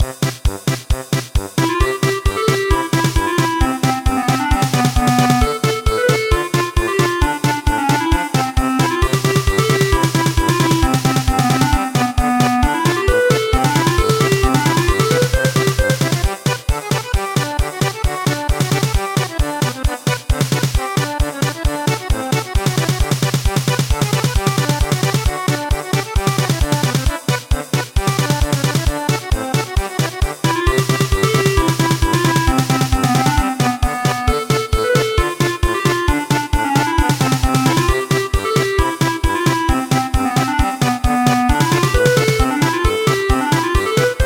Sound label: Schottish German Polka; midi; traditional